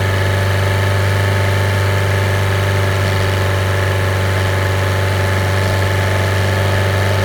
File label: Toyota Corolla Verso 1.6 engine 3k rpm loop; mono; Soundholder